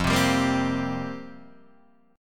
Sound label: F Suspended 2nd Suspended 4th F down Guitar DADGAD